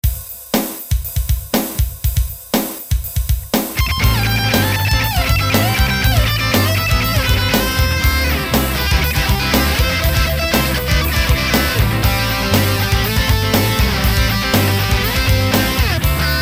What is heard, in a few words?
solo